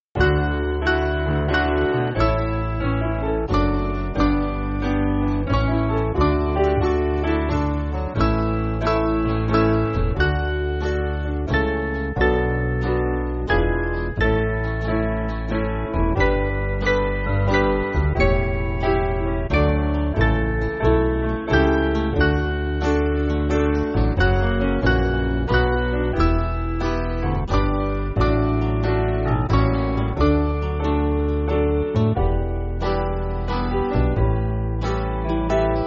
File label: Piano, Backing Files for Churches; Lord I Was Blind; Saxby; Mainly Piano (CM)